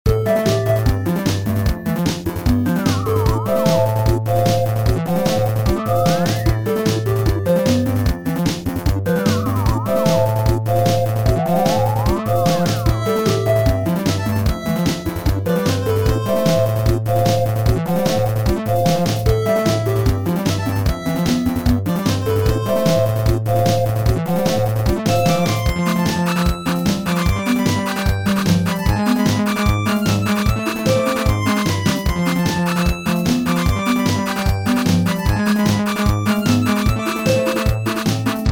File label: 8Bit; Mikie